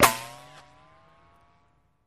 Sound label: Bullet Ricochet Sin